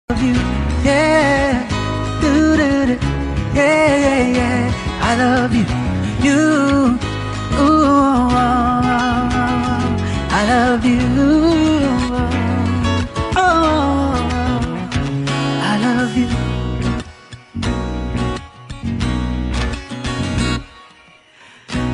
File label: lovely ringtone; valentine's day